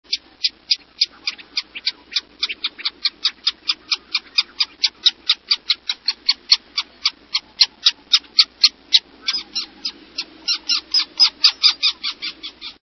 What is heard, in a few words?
Black Tern